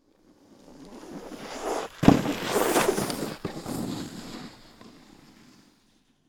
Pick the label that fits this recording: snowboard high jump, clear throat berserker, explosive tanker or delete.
snowboard high jump